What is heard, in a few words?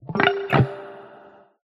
New Door; kill it with fire heatwave android ios ps4 switch windows xbox one gamerip 2020